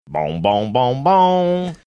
singing first four notes

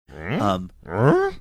making two curious sounds

